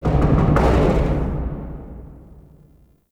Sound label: Ghost Cargo Knocks; Ambience; Props; Warframe; The New War; Zariman